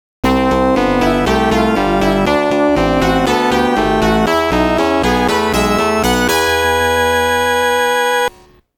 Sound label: RETURN IN TRIUMPH (STAGE CLEAR); R-Type Original Sound Box